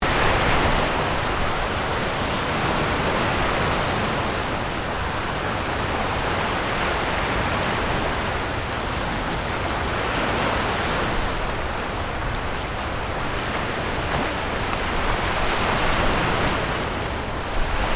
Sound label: Sea